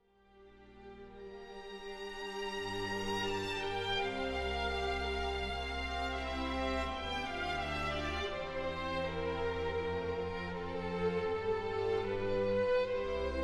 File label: Concertos for Piano; Mozart; Sondeckis; Concerto KV 415; Andante